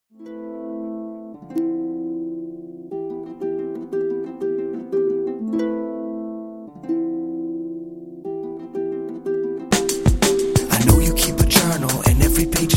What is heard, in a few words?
atmosphere